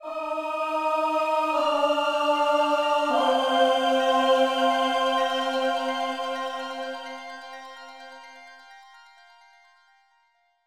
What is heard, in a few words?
bgm won; ZeroRanger